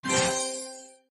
total win

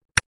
lottey roll end

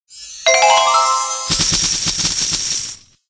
win; yule

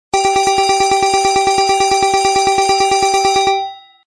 Big Win; hockey

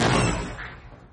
superbigwin boom; yule; captain

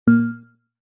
Normal Auto Spin; hockey

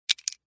sound jetton

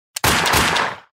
start

